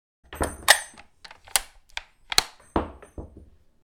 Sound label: Sniper rifle reload